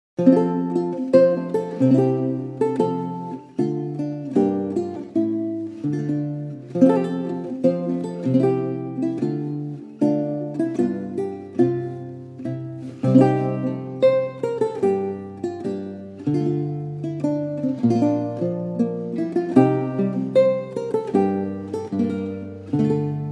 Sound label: Lute Air; Ren & Stimpy